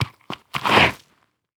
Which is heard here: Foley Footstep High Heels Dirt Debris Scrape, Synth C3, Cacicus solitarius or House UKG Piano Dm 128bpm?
Foley Footstep High Heels Dirt Debris Scrape